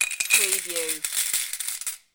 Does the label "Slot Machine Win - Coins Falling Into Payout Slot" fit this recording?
Yes